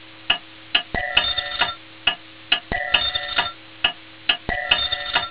fast clock; Motorola MPX200

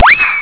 cartoon split; Motorola MPX200

